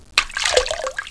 DRIP, Household

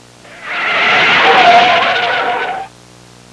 SKIDDNG; Transportation

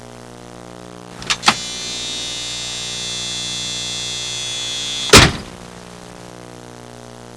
OPEN DR; Transportation

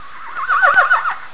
WHINNY

